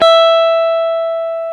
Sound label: GTR, Dual Guitar; Club-50 - Foundations; Paul